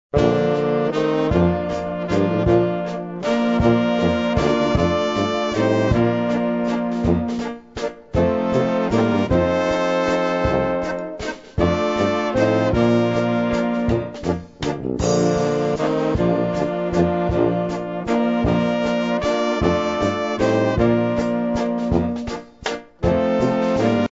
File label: waltz